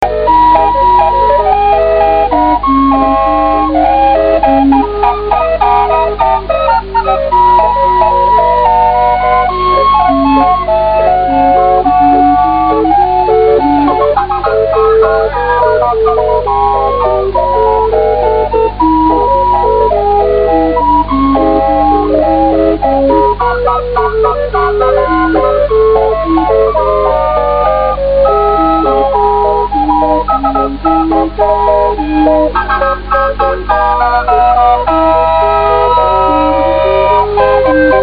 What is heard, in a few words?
caliope